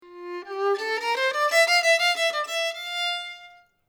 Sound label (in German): geige